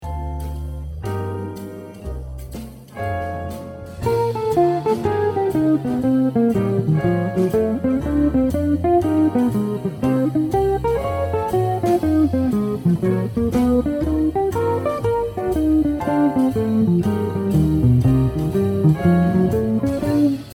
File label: Lick